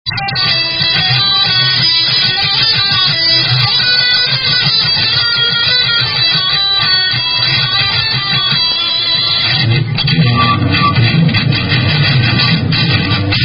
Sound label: Instrumental Only; A Place In France